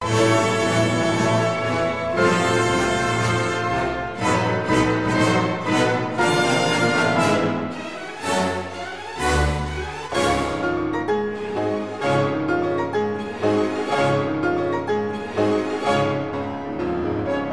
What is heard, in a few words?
Piano Concerto No 2 in A Major, Marziale un poco meno allegro; Franz Liszt; S 125; Un poco animato